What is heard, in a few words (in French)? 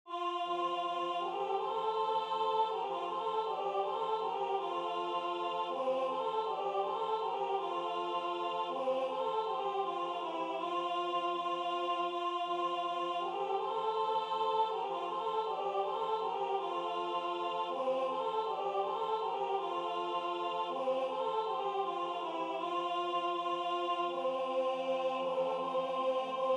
alto